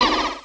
brake; opensurge

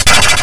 lightning; mazeofgalious

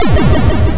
mine; mazeofgalious

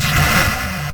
breath; mazeofgalious

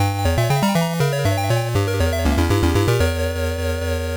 demon; mazeofgalious

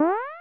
jump; opensurge

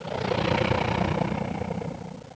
growl; opensurge

